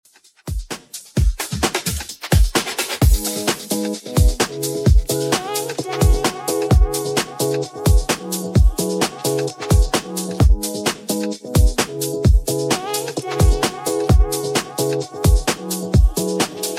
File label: Top40 Redrum 130bpm